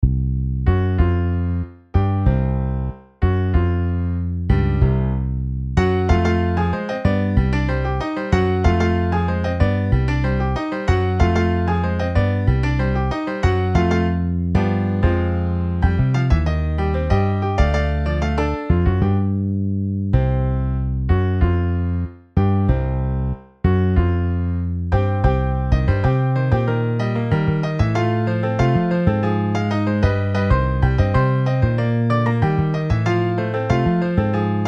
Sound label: Piano Bass MIDI